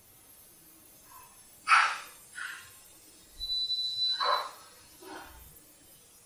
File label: More sounds from kitchen; SOUNDS FROM KITCHEN 1